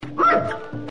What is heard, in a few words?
Trick Bark; Prince Tricky; Bestiary